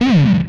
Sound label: Sci Fi Gun, Blast; Space Blasters2D